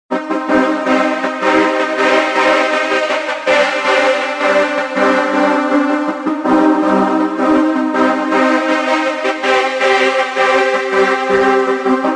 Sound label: Harsh to lush